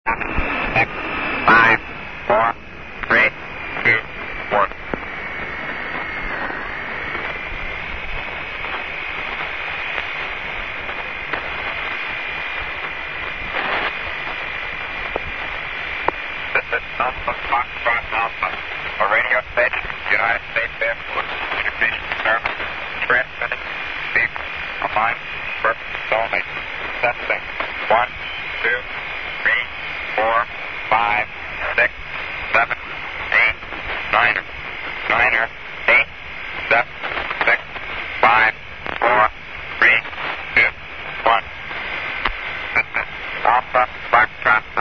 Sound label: usb